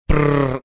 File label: making pistachio shelling machine sounds